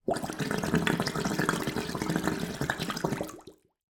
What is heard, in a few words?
household bath snorkel blow bubbles shallow